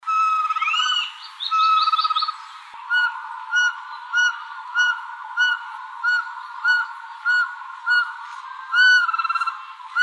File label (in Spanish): caburé voz